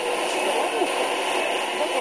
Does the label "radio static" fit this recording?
Yes